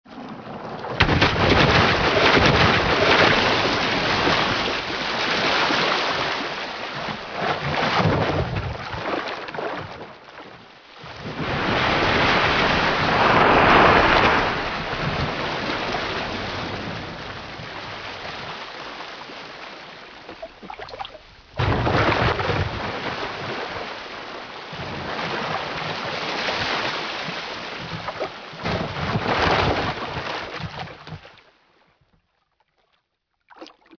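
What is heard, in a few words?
shark sounds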